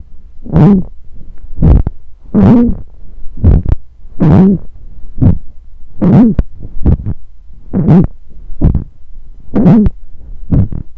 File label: Hip Creak